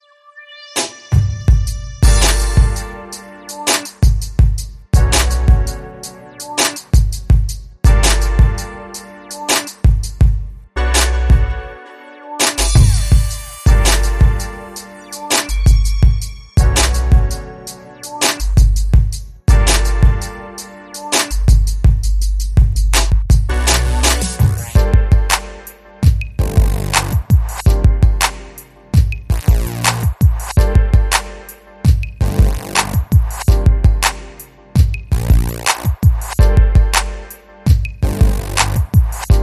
Pop Hop

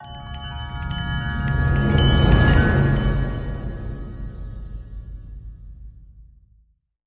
Winter Storm Chimes Cres

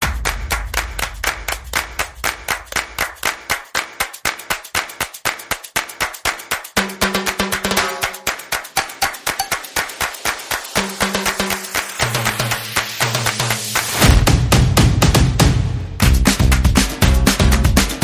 Synth Mix

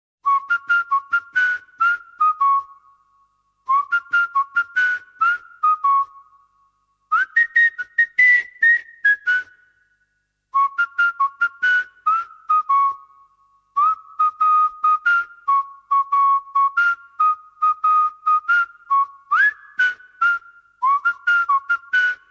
Whistle Only

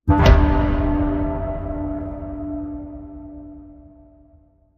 Broken Piano Boom

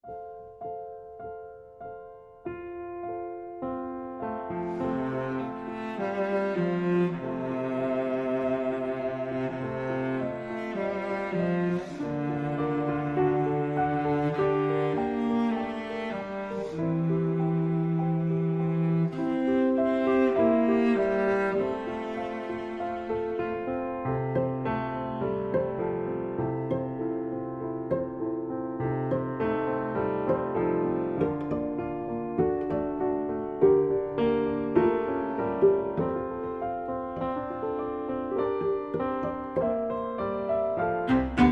Jingle Bells

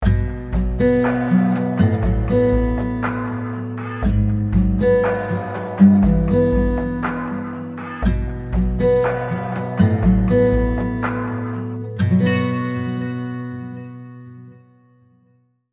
Bed

